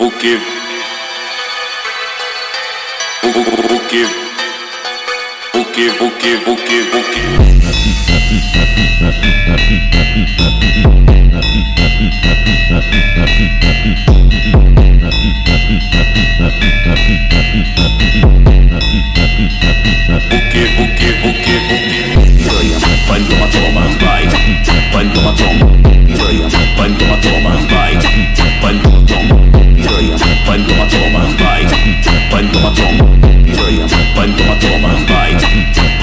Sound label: Phonk Music; فانک phonk